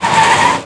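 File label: meter skid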